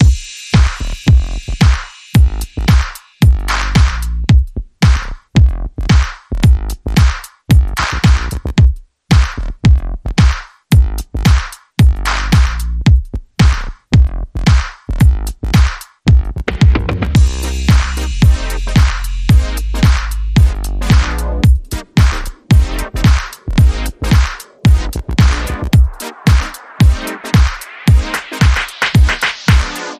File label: Funk Era Rhythm